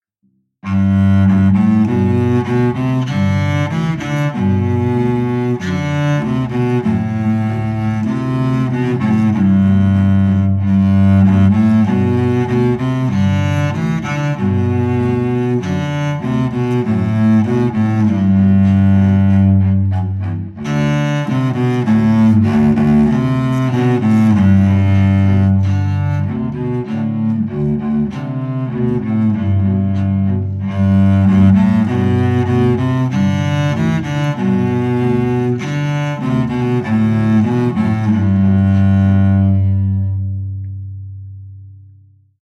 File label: no bass bar, violins